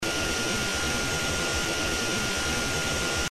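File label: vacuum pipe loop; Danger Mouse - Ultimate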